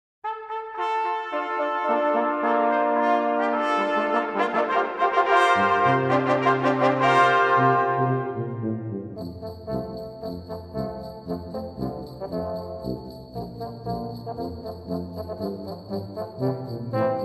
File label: Jingle Bells, brass